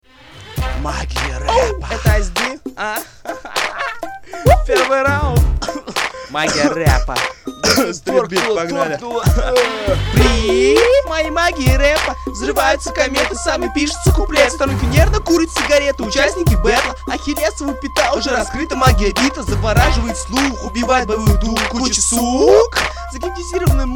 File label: street beat, rap; 1 raund